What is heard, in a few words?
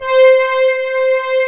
PAD, SYN PAD